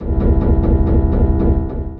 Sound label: MPE Slide Sample Scan; Ableton; Granulator III; Device Templates